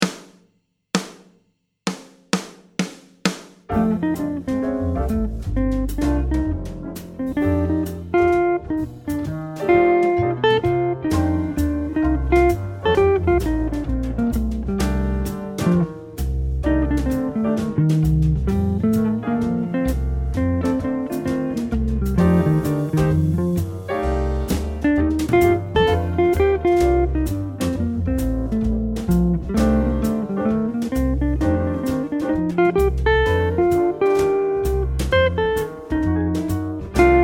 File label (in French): jouer mode mixo chorus, guitare jazz